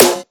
crisp snare drum sample f key, Royality free snare drum sample tuned to the F note.